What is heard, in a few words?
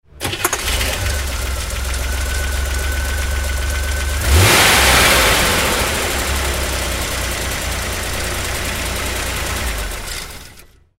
Vehicle